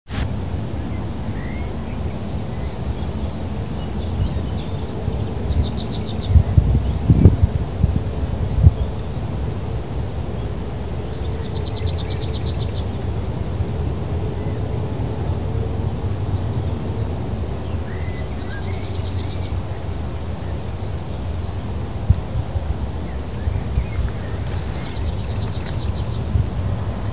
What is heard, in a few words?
Lesser Whitethroat; Mobberley